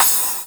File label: CYM, HOUSE; WORS STATION